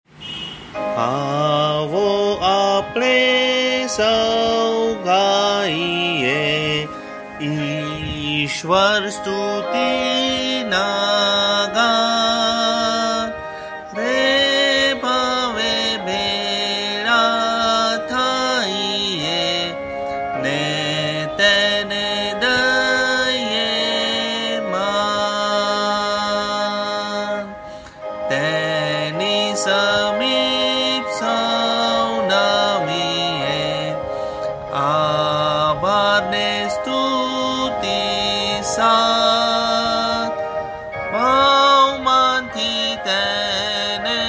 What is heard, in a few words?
Guj Notation Sung